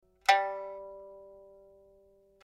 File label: pipa; chuYinKouXian